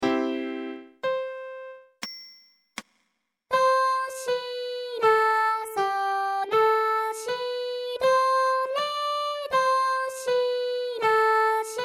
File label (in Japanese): ミックス音声(L：ピアノ R：ボーカル); コールユーブンゲン No.4 a)